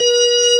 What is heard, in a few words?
B3 C 4, organ; VOCAL ORGAN; ORGAN #1